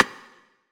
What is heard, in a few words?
percussion oneshot rim; Pop; Drums; SD PASADENA Pop Sample Pack; Slate